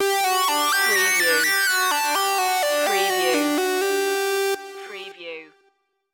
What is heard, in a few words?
take flight stinger